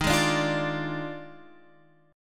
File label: D sharp Major 7th Suspended 2nd Suspended 4th Guitar Open F; D#M7sus2sus4; strummed